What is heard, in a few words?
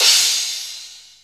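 cymbal crash; Best Service Gigapack 2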